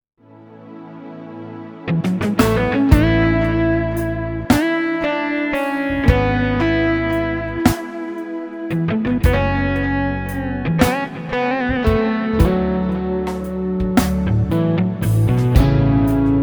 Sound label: Overdrive Guitar